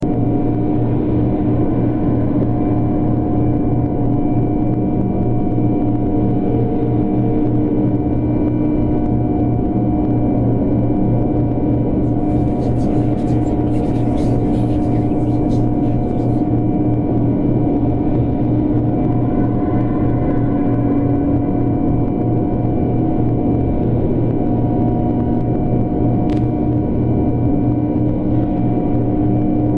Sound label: Pipe Dreams Ambience; backrooms 2d android ios online windows gamerip